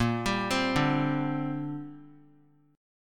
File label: A sharp 6th Add 9th; A#6add9 arpeggiated; JGuitar